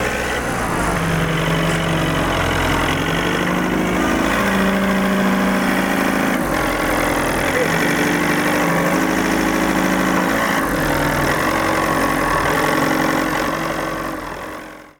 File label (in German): Gabelstapler